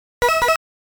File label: Power Loss Warning; pac pal 1983; PAC-MAN